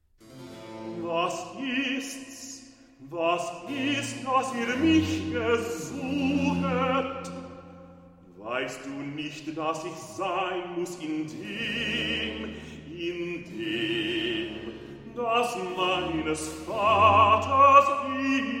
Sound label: Recitative; Liebster Jesu mein Veriangen; Bach; Cantate